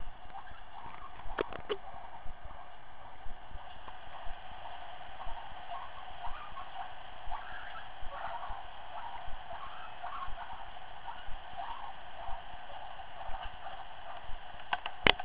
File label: whining, guinea pig